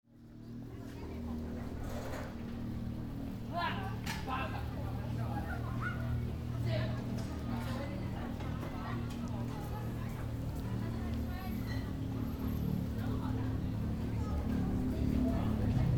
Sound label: motor train and child